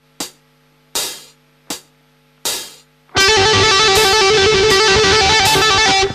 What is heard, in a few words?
leg